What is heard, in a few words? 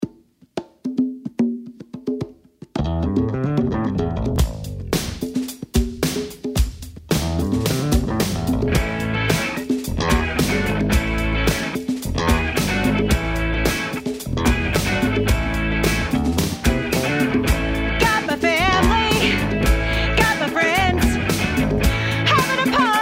Birthday Song